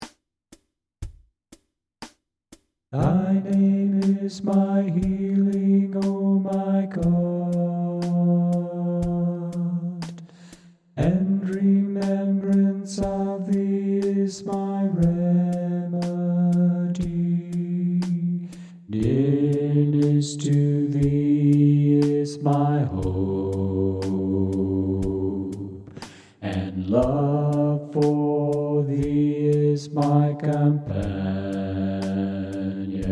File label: bass